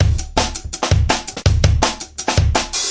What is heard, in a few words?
live thick flashy, drum loops and breaks, 165 bpm